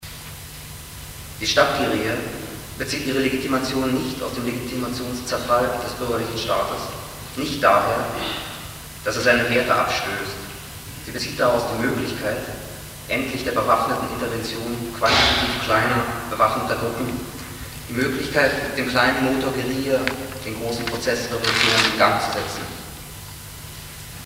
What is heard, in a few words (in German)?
der kleine Motor; Auszüge der „Erklärung zur Sache“ der Gefangenen aus der RAF in Stammheim